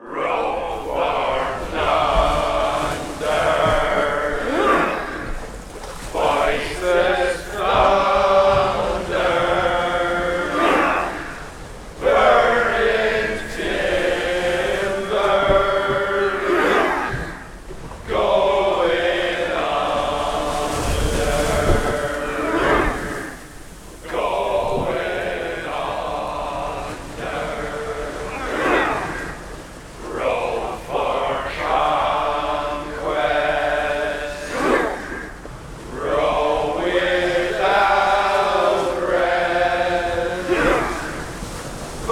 Drakkar Shanty Loop

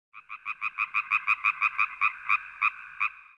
frog; Joan of Arc

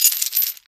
Chain Collision; Angry Birds Rio

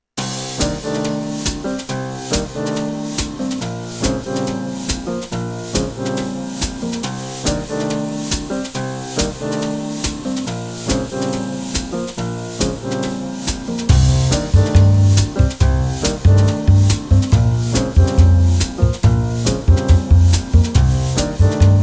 Lounge Music; After The Rain; Gran Turismo 5

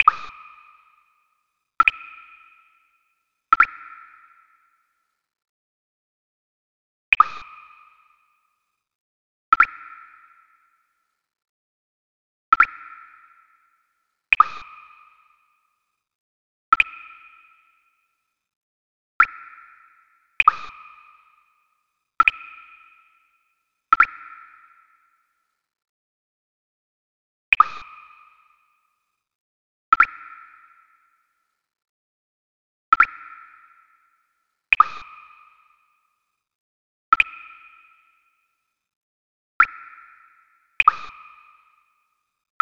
Dripping Ambience

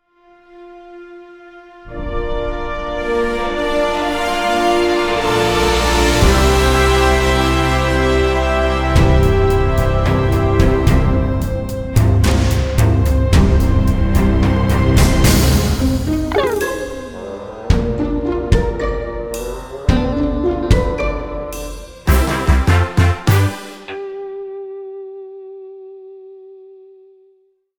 Music Intro Cinematic

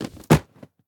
Drop Book; The Town

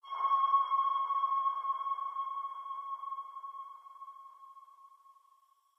Amb Space race Solar ping

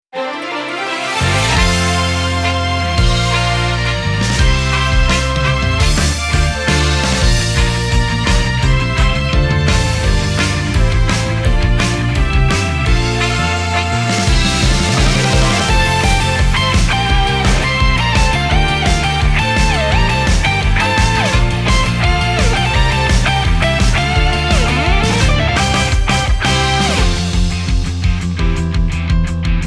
Inchoate Voice Instrumental; omega quintet

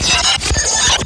down test

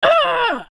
pain

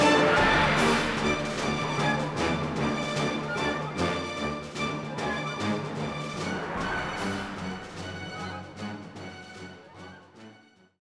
Music Battle; Chaotic: Shadow Warriors; NDS

